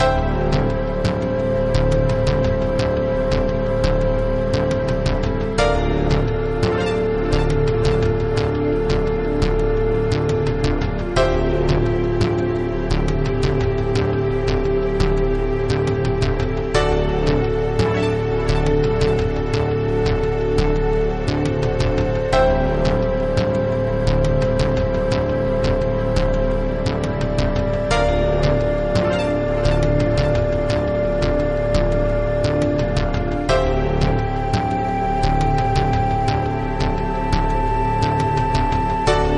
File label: Ending